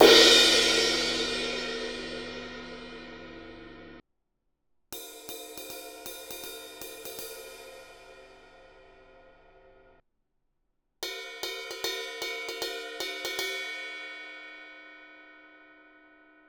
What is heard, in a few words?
sabian 20 inch hhx manhattan jazz crash ride crash ride bell